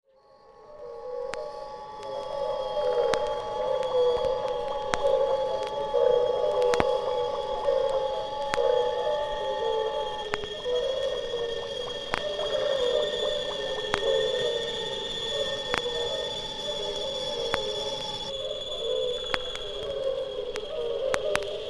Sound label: birdsongs